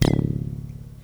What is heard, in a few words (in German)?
Rohr; Einzel-Ereignisse; Echos (17 pro Sekunde)